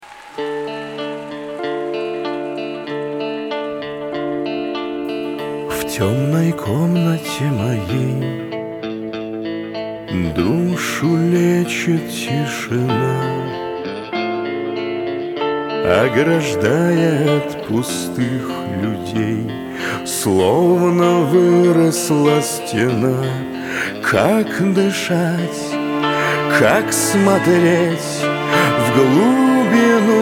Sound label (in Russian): krik c simfonicheskim orkestrom